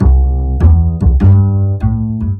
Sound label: Blues C Bass Walking 1 bar; Blues-loops-no-key-change